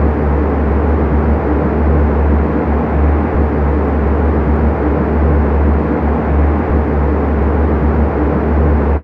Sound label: car ambi tunnel, street traffic; best service Blue Box